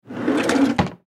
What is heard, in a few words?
Drawer Mechanic Shop Close; Drawers; Household; Industry